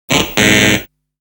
Wrong Answer Noise Contestants Hear When They Give A Wrong Answer; Family Fortunes